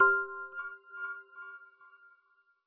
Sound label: Feedback